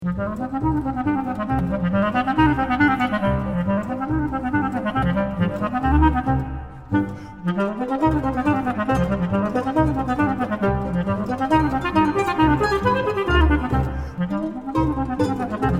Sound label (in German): derwisch